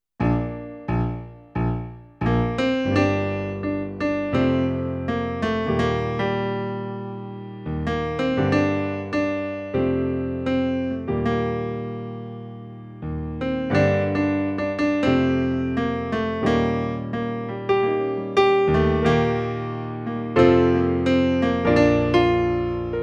melody

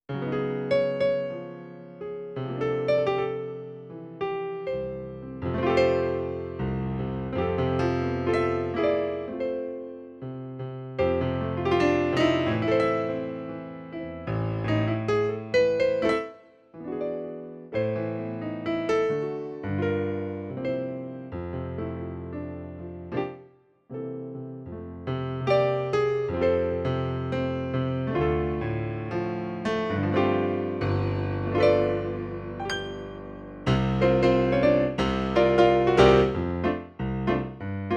Tenor accompaniment; RIVERSIDE THEATRE

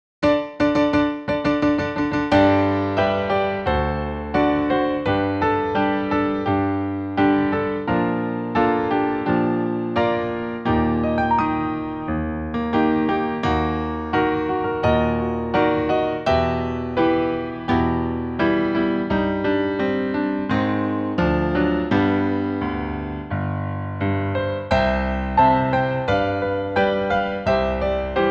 PIANO

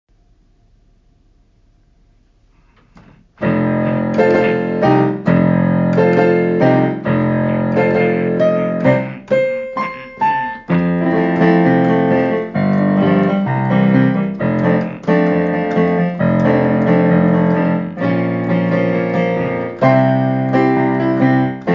Accomp

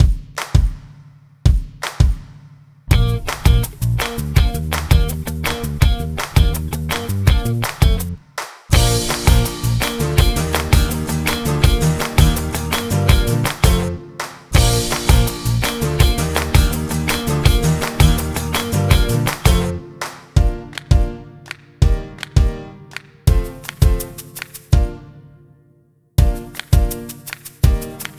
MUSIC

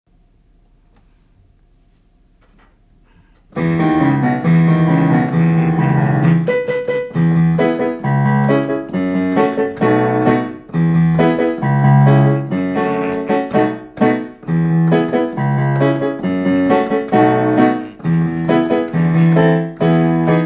Accomp

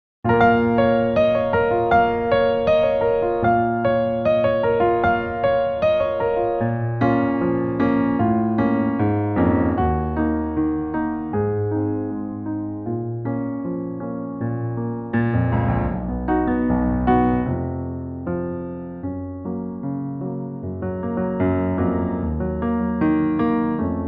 PIANO

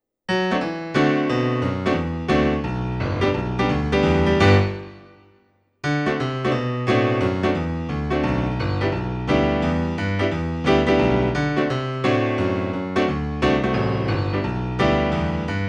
accompaniment

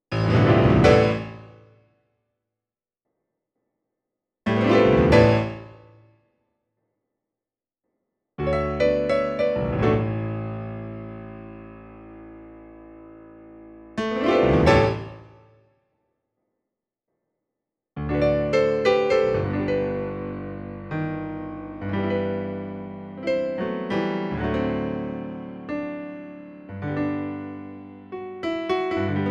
Baritone accompaniment

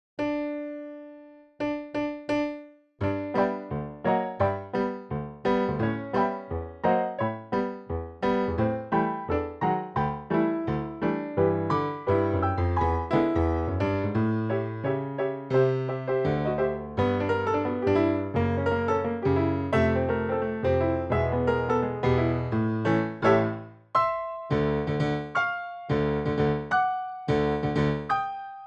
backing track

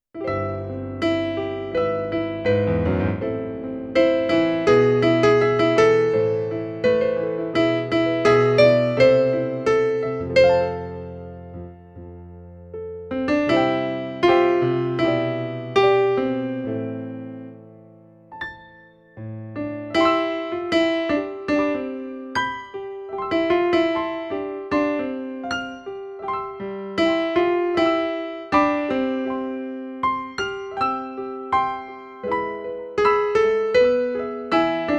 melody

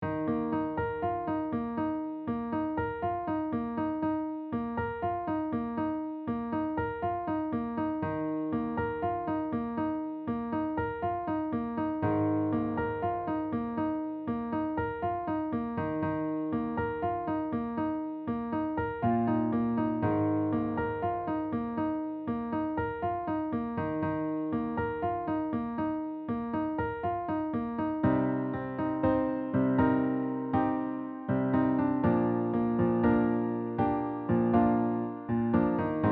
piano FOLEY

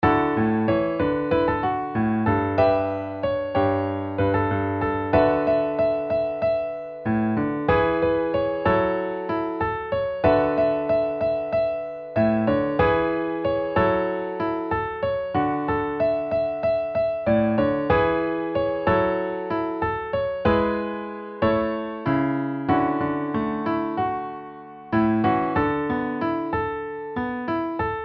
piano

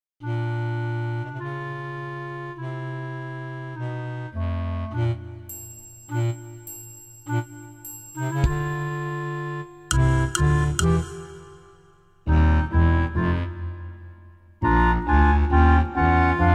Karaoke

